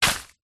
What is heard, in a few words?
food bunya nuts many in plastic bag down on ground; zapsplat